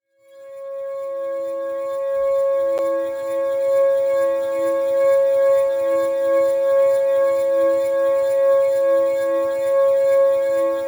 tesla high vibration

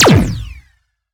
Shot Small Laser Recon Pistol; Robobeat

